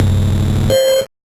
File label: Noise, 8-bit, Bitty Noises